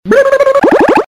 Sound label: Tongue; Motorola V501; China